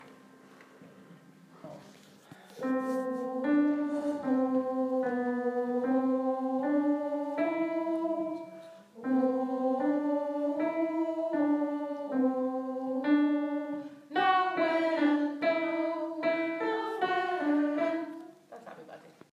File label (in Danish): the babe in bethlems manger laid vers alt